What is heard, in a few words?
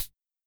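Hi-Hat Open, Luxor Passet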